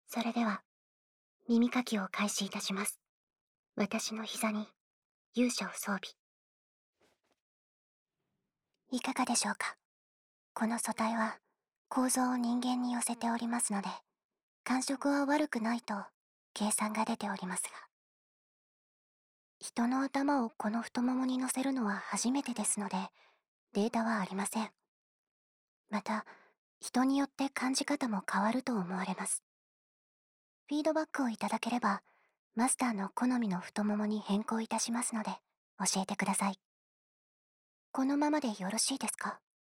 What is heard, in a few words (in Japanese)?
耳かき右; 勇者